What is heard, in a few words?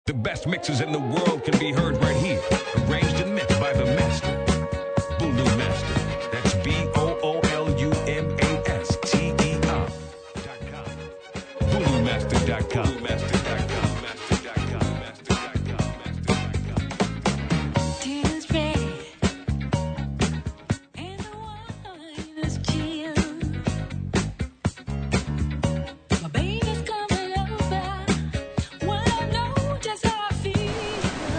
Old School noise